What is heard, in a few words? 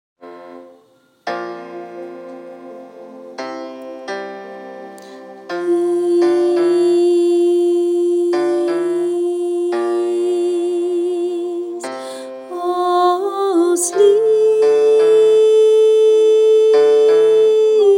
silent night soprano 2